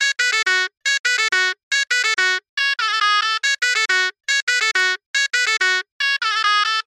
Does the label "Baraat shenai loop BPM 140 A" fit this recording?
Yes